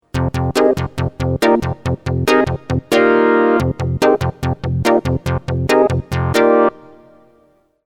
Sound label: memorymoog chicks five